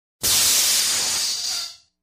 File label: Звуки пара Sounds of steam